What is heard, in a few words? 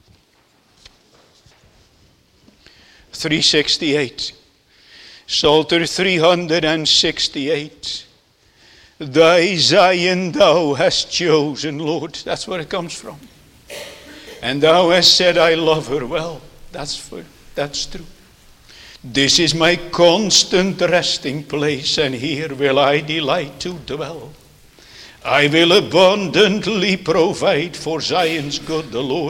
Singing